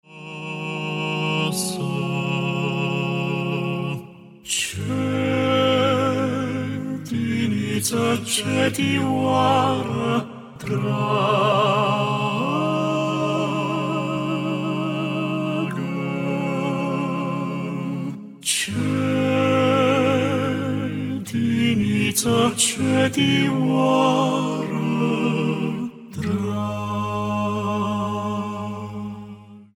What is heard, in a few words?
Voice Quartet; Coroana de trandafiri; craciun